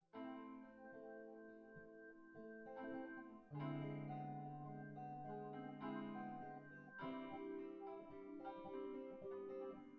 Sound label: no drums synth conv 1m sim; SASSEC07